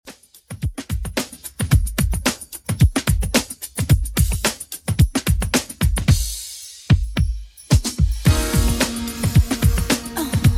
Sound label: 90s Redrum 110bpm